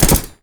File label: Shake Table; Blastmorph Pinball; xbox series xs